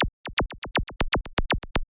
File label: Clickers 120